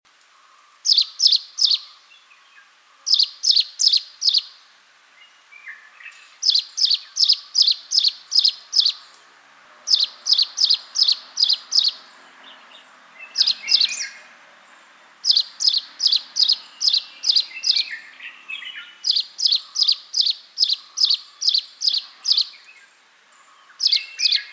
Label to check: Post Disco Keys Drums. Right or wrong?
Wrong